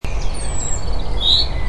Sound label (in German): buchfink regenruf; erlenbruch